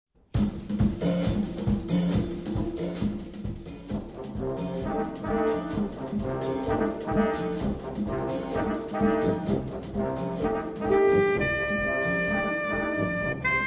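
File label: Samba, Band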